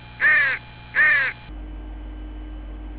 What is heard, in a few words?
crows